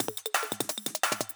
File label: 175 D&B Drums; Dance DJ; Drum n Bass